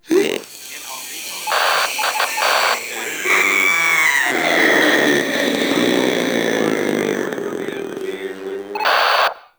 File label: Proto Infested Runner Idle; Warframe